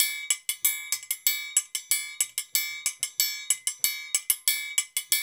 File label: Hip-Hop Gong